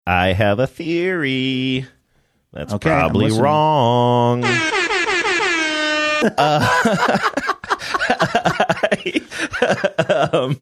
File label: sing song air horn laughing; saying i have a theory thats probably wrong